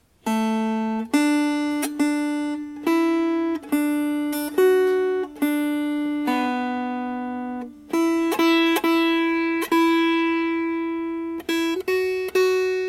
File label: Melody